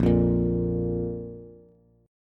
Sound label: A sharp 5th A sharp Bass